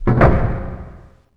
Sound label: door close; left4dead; rpd